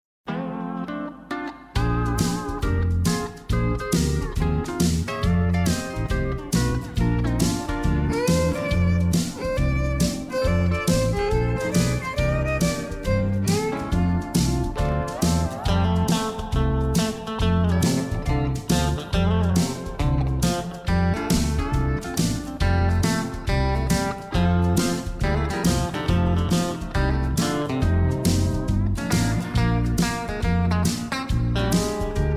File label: male, square dance music